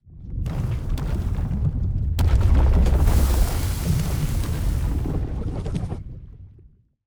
magma megafauna; Stellaris; extreme frontiers

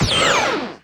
laser turrent fire, weapons; Stellaris

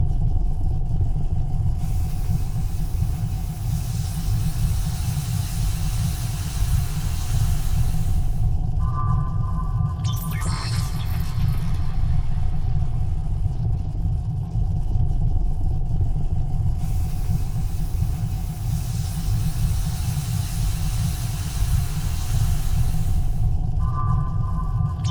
ship science ship idle; Stellaris; machineage

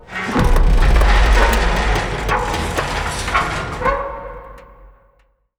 archeology event metal fatigue; ancient relics

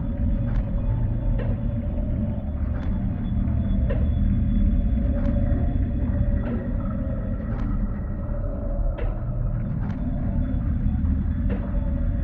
cylinder ship idle; Stellaris; other entities

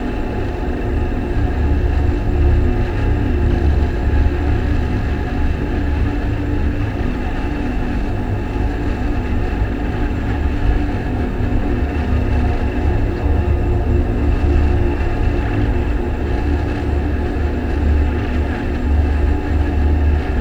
construction ship hum; nemesis; Stellaris

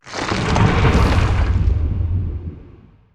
lithoid moving out transport; Stellaris; lithoids

